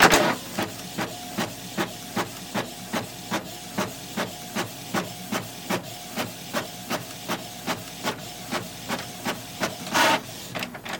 new printer; electric

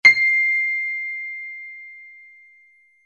piano C2

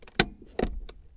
emergency brake; car

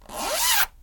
zipper

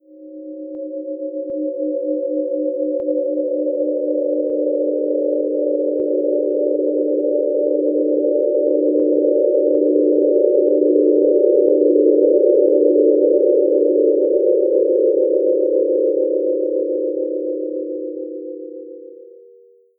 death sound, horror; 誘い２/試聴注意

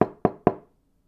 knock on thin board; knocking

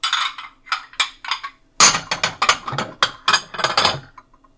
clear dishes; cooking

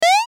jump

